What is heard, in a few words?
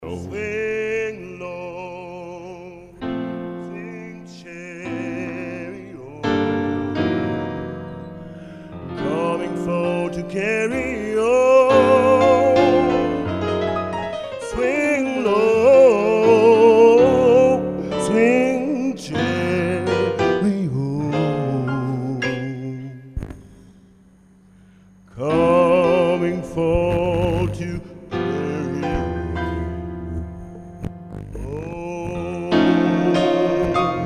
swing low, gospel